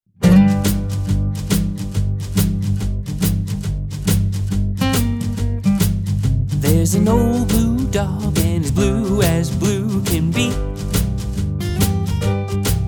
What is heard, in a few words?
Blue Dog Blues